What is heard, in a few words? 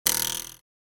Cartoon twang ruler wobbling; boing